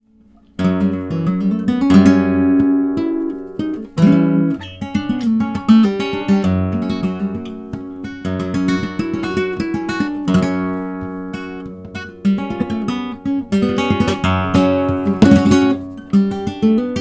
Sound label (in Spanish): falseta